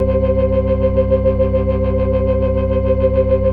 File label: FAST VIB, TREMELO+VIB, Synth and Organ